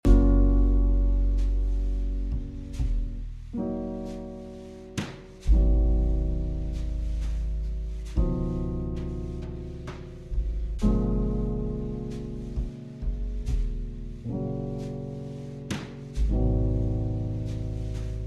Relaxing Jazz

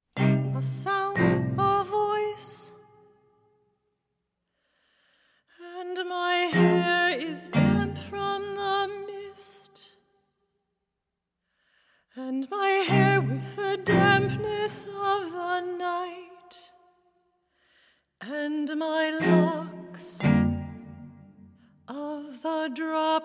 Voice, Viola, Cello & Percussion

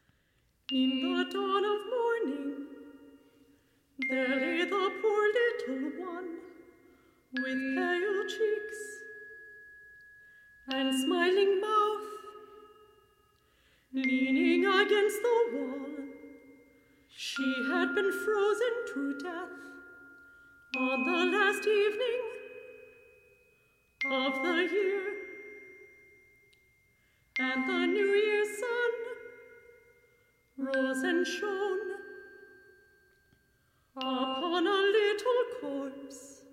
Version For 4 Voices & Percussion; No. 14, In the Dawn of Morning

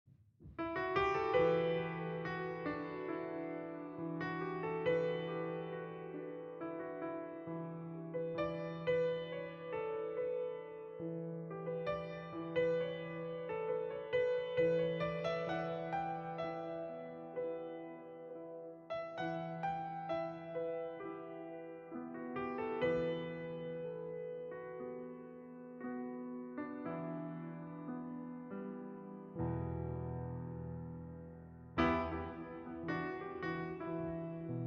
Smooth Jazz Band

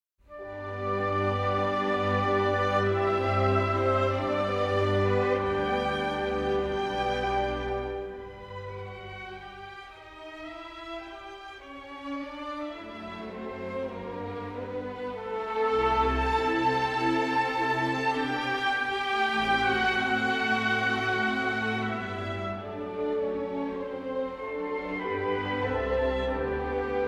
Violin Concerto in D Major, Andante; Mozart; Violin Concerto No. 2

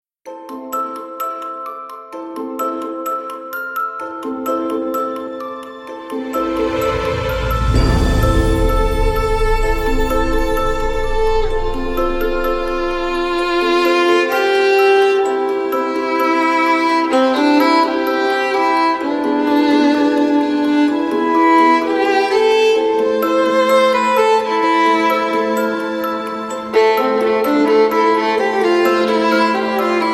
Orchestral Version

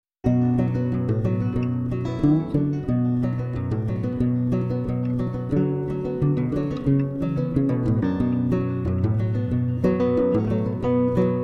Mountain Banjo

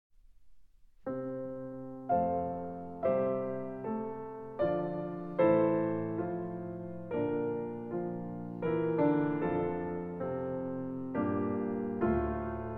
Klavierstücke in F Major, Romanze. Andante

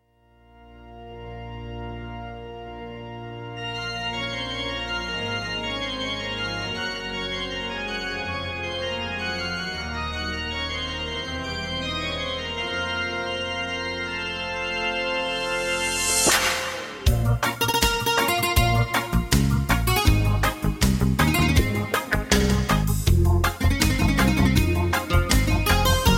Reggae

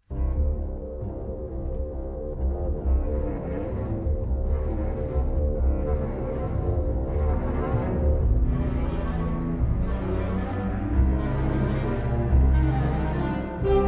For Alto Saxophone & Orchestra; From Catch Me If You Can; III. Joy Ride

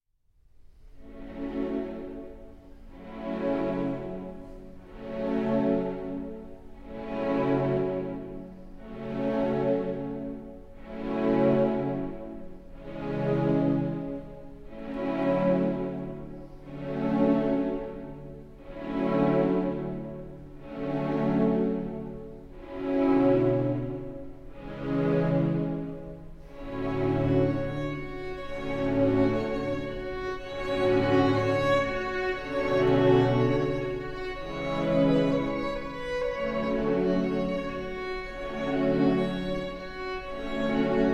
String Orchestra

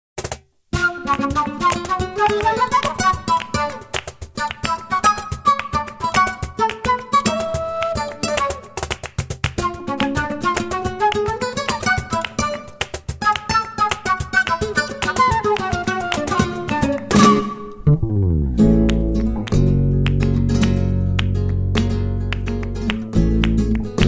Rumba

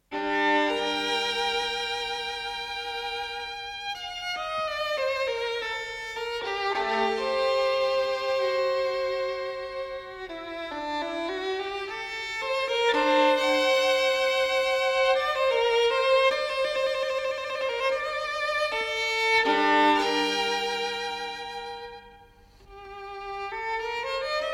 Violin Sonata in G Minor Adagio; Violin Sonata No. 1; BWV 1001

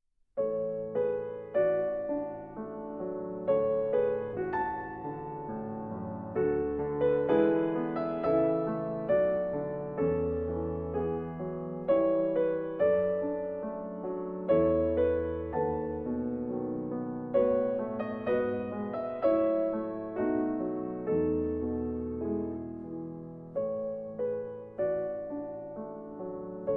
Piano Pieces, Intermezzo in A Major; Brahms 6 Piano Pieces, Op.118

